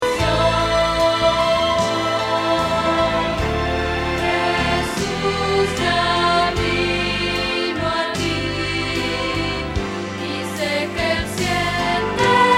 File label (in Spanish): Contralto